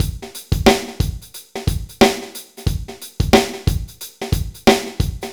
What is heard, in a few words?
bpm 90 amb